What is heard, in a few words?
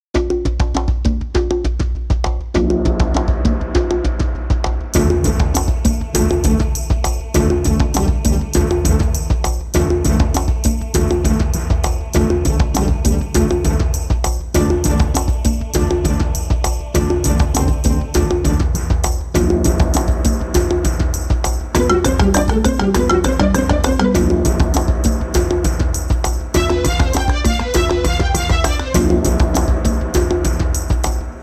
Ethnic Symph Danger